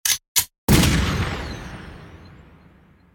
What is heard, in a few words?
alert sound cheer